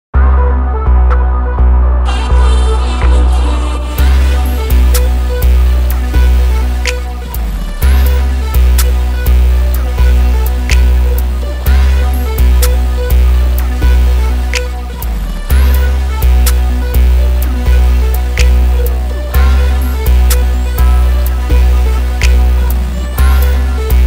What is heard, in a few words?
Instrumental